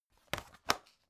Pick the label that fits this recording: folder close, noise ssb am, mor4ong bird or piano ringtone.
folder close